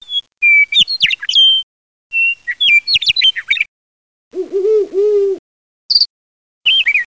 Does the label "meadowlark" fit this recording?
Yes